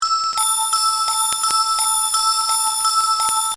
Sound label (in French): fond bell; Collection Synesthésie; Isabelle Grosse